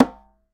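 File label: Conga Hi Mute; Roland BK-5; Percussion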